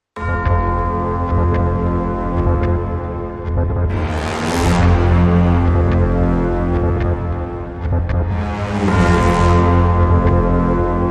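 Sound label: Hybrid Sting, Orchestra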